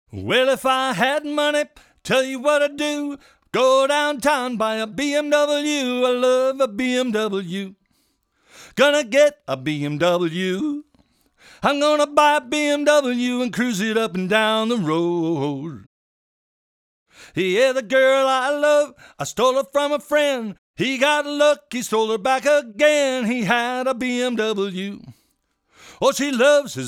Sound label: Vocal; BMW Blues EWU